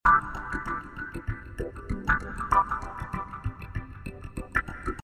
Swinglefunk C

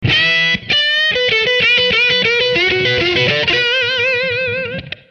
B4 130 rhythm

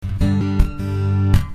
Punchy G 158

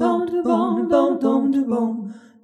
Jazzy Harmony

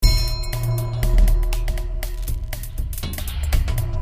Film Tension 120

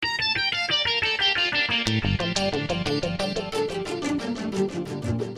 Gtr & Pizz Echoes 90 Gm7

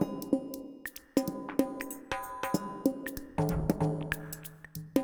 Deep Mover 95

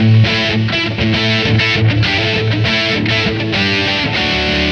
rhythm, 100

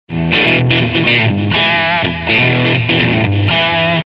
122 rhythm funk

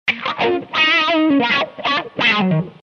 Eb 080 100 solo Wah

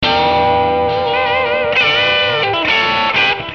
Em 135 country lead

